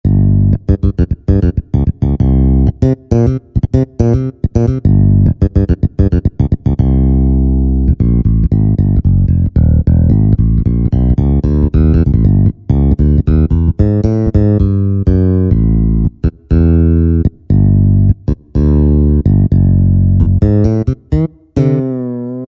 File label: Finger Bass, Yamaha PSR-3000; Factory Voices; Guitar & Bass